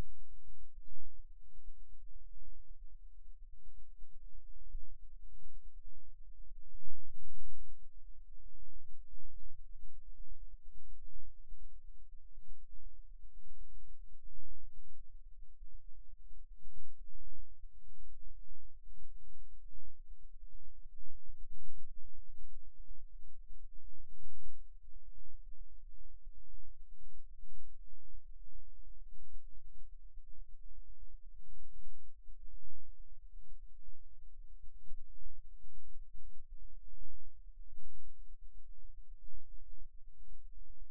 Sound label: Rhythm Wave, eeg seizures